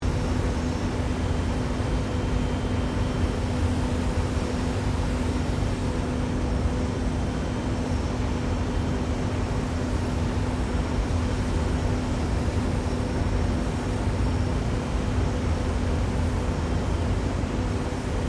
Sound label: Industrial Boilers Operating Distant Loop; Hospital aiconditioning plant; Machinery Sound Effects; Machinery (Sound Effects:Machines)